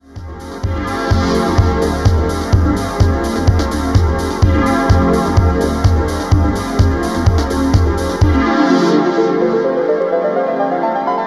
Beat Summer (Ambient)

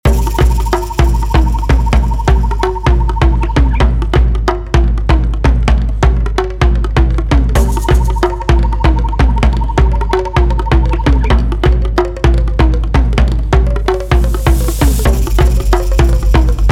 Talking to Drums; Six percussive driven groovers.

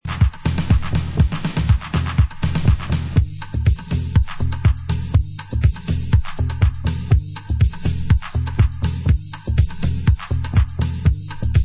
Instrumental

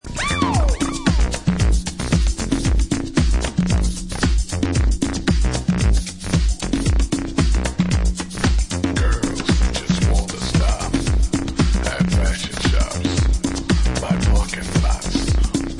seaside conga; disco

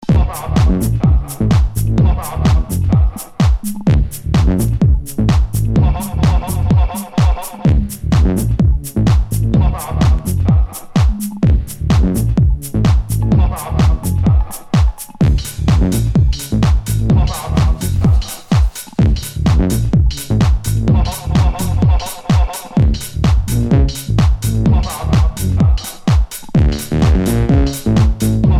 tuba; House

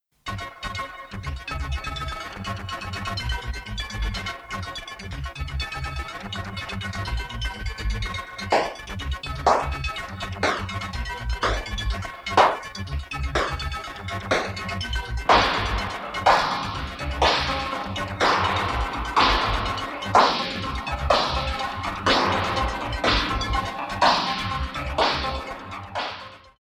Explosions

